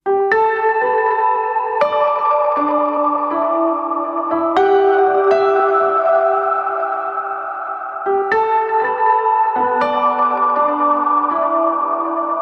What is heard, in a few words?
Swing Tone